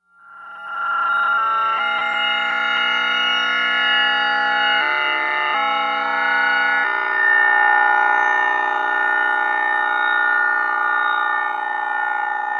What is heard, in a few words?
DISSONANT RING MODULATION; Sonic Foundry (Sony Creative Software); Vir Unis Infusion